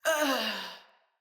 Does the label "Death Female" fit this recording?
Yes